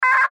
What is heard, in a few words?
Radio Morse Code; Weak Signal Letter N; Warfare; Blastwave